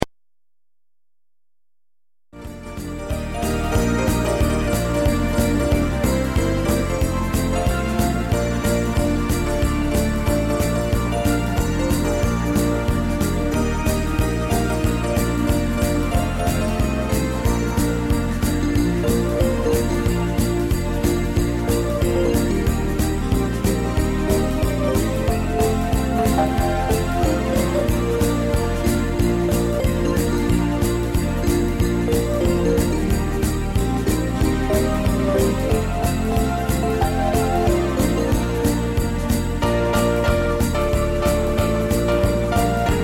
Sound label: jingle bell, weihnachten